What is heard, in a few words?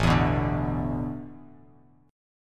A Suspended 2nd Suspended 4th, Bass Standard; Asus2sus4 strummed